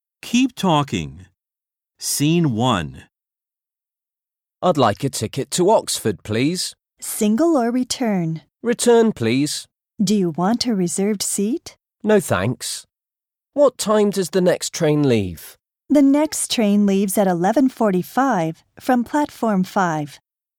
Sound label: harmony; 新課程英語教科書 指導用音声CD